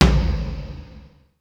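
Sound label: lo floor; sr16 tom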